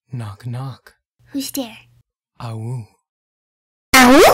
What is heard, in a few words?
Awoo